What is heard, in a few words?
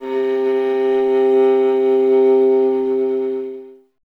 viola C; Miroslav Vitous; Solo Instruments VOL-1